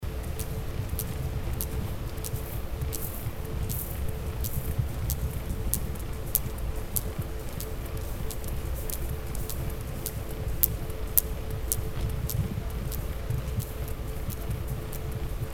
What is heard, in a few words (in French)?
Cachalot Gibraltar